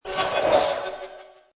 Overtake, Sci-fi, swoosh, whoosh. Fast craft passing by; Sound Design